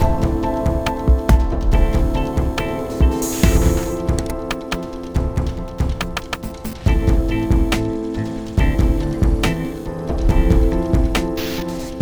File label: Trainride Stationary Medium; rive wreck hack die retry; ps4